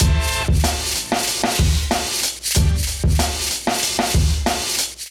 Kit, Drums; Ableton; Factory Packs; Chop and Swing

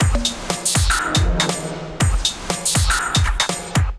Kit 137 bpm; MIDI Clips; 120 - 150 BPM; Alert Kit; Ableton; Factory Packs; Skitter and Step

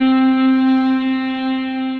Synth Pad; Apex Twist; Ableton; Glitch and Wash

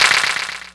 Clapbox Pitch 17; Ableton Foundation FreePacks

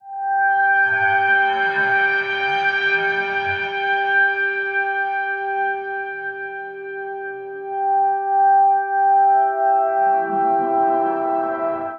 Transmission Drone Em 80 Bpm; MIDI Clips; Instruments; Ableton; Drone Lab

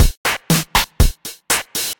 Kit-DMX, Effected Drum Kits; Kit-DMX Bust It; Ableton; Factory Packs

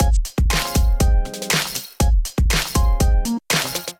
Shady Kit, Drums; Ableton; Beat Tools

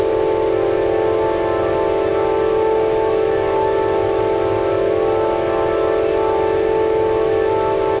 Petrol-Driven Organ, Harmonic Drone Generator

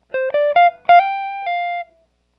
077 Pop Guitar Fill Dm; Pop Rock; Electric

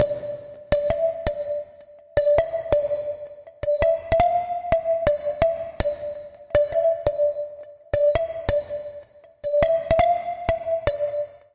Warp Bell A Minor 83 bpm; Melodic; Tonal; MIDI Clips; Drive and Glow; Ableton; Factory Packs

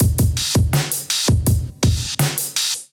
Kit groove 82 bpm, Drums; MIDI Clips; Safe House; Ableton; Factory Packs; Chop and Swing

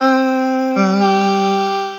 Chromatic Voice; Chromatic Voices; Basic Voices; Ableton; Factory Packs; Voice Box

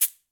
Cabasa Short; Latin Percussion; Drums; Drum Hits; Shaker; Ableton; Factory Packs

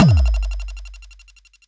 hard Kick; Futura; Ableton Foundation FreePacks; Wicked Kits

